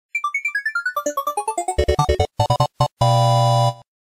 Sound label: Level Start